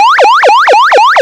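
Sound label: emergency vehicle whoop, sirens; code 3 h2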